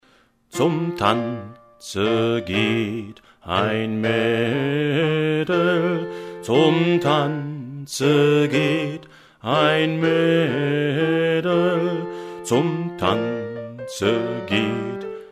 tanze bass

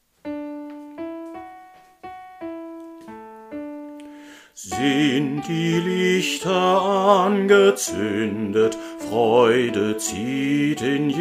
bass; Sind die Lichter

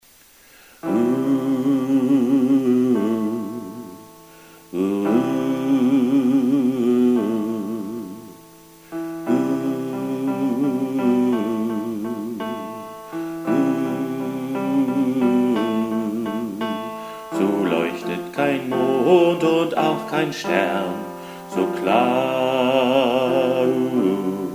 hallelujah bass

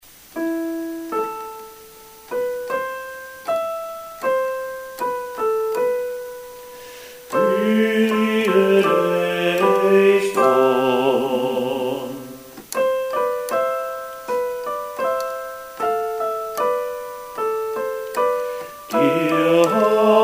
maria bass

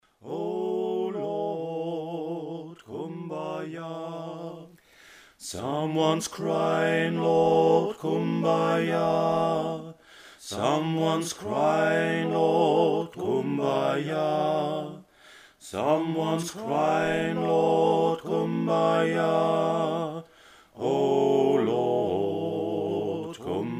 kumbaya bass

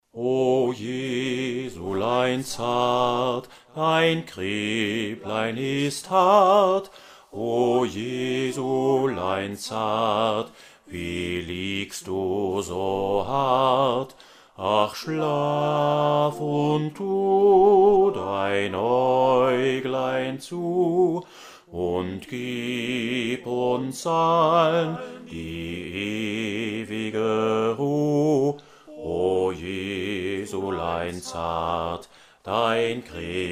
o Jesulein bass

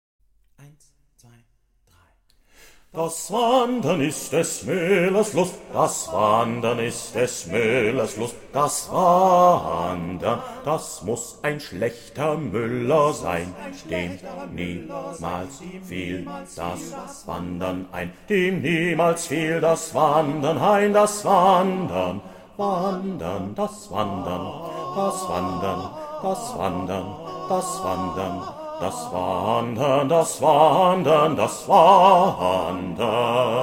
bass; Wandern

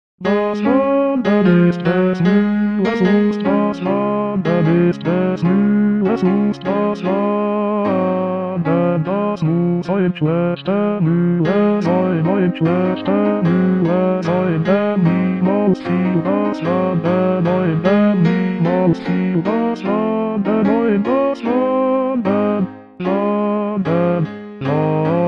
wander müllers lust bass

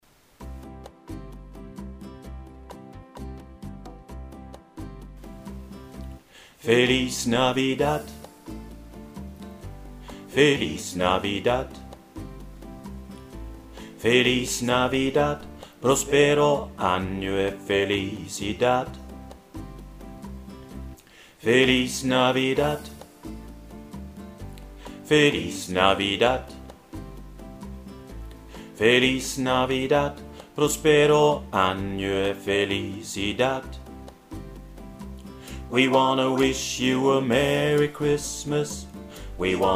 bass

